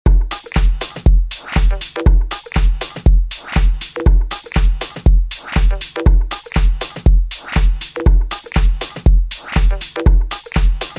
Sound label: Instrumental Piano Mix